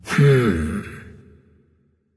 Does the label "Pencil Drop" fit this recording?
No